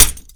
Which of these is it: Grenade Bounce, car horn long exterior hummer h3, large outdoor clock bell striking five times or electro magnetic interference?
Grenade Bounce